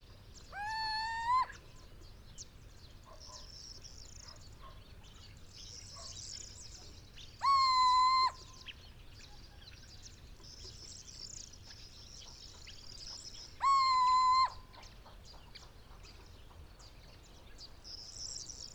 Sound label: Cucumiau Hooting of male saharae Morocco